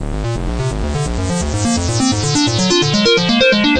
analog climb, synth and electronic

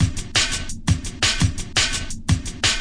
171 bpm crisp atmospheric, drum loops and breaks

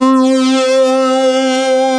Lead, Synthesizer Middle C Notes; multisampled; synth keys

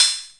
Korg KPR-77; DRUM MACHINES

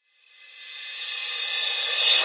classic hh Rev Crsh; STYLE KITS (Hip Hop, Etc); Classic Hip Hop Mega Kit

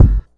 kick lofi metal resonance; Kicks; single hits

